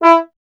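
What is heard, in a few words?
bass trombone E; Miroslav Vitous; Solo Instruments VOL-1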